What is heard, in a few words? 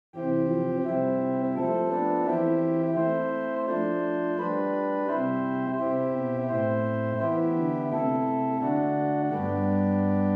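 Lieblich Gedackt 8 + Clear Flute 4, organ; Great: Lieblich Gedact 8', ClearFlute 4'; St. Anne; Hauptwerk; St Annes Moseley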